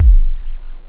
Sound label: classic, drums techno; OctaMED SoundStudio V1